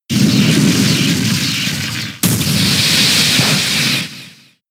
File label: Thunder; pokemon sfx gen 6 attack moves xy oras